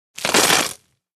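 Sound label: INT: Crushing ribs, Ribs Crush Bone Crunch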